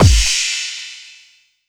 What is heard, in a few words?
BD, Dance Drums